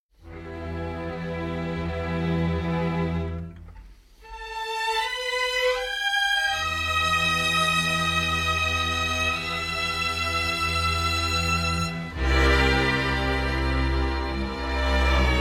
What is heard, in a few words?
Instrumental Rough Mix